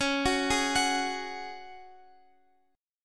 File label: Db Suspended 4th Db, Guitar, Open F; Dbsus4 arpeggiated; x,x,13,13,11,13